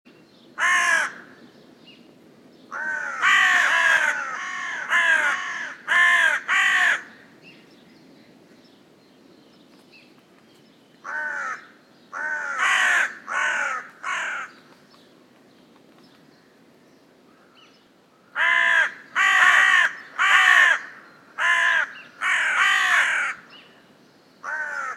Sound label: Corvus corone; SETE